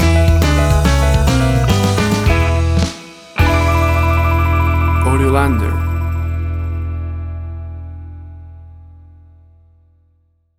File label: 1950s Rock and Roll Country Shuffle Stinger